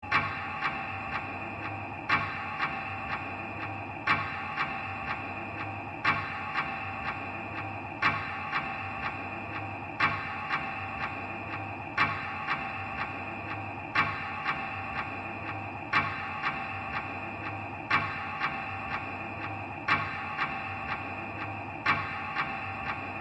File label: Witch Time Clock